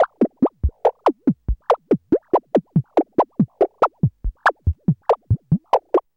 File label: BEAT, RHYTHM; AMG - Vince Clarke Lucky Bastard